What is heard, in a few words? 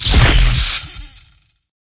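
trap lightning attack; monster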